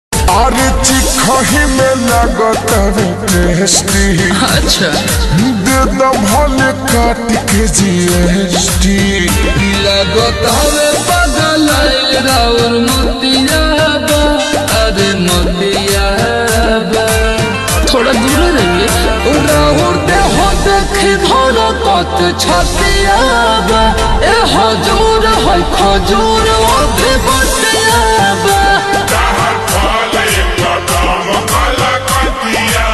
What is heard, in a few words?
Ringtone, slowed and reverb